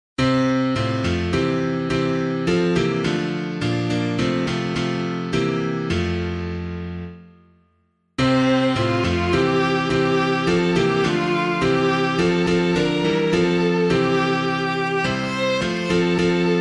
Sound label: Introit, piano